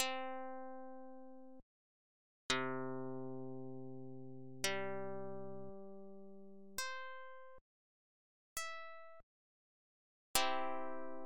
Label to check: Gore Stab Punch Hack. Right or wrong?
Wrong